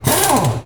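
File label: Impact big, air tools, industrial; Air toolks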